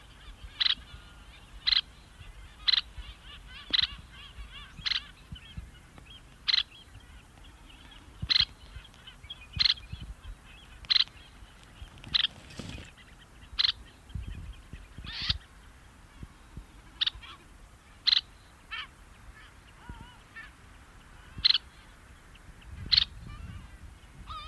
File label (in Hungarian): vékonycsőrű víztaposó hangja